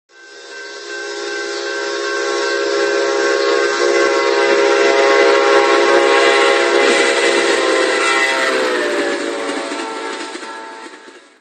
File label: Amtrak Horn; Amtrak 85